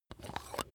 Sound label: razor battery compartment open